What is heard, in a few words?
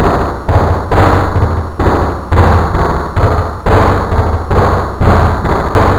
Evil Banging; horror; horrorgifs